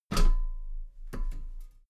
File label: step metal ladder step top regular